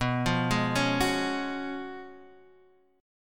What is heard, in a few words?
Gb Major 7th Suspended 2nd Suspended 4th B, chord